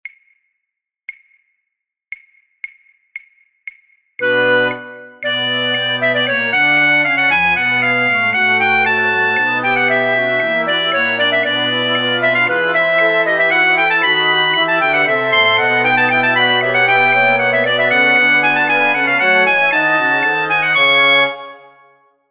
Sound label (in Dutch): fluitorkest, Fluit; Matthaus Passion